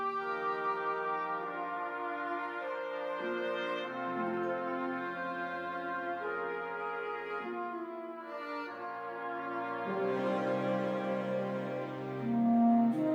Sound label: Music Only